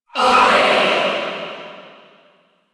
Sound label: Hand Stunblast Away; Duviri